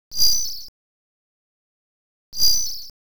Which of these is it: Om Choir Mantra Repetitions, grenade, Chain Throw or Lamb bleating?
Chain Throw